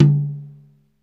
long tom drum one shot d key, tom drum sound tuned to the D note